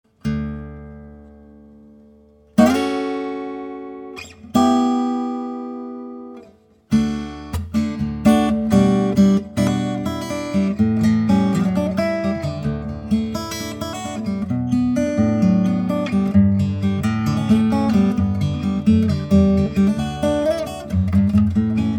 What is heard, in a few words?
Guitar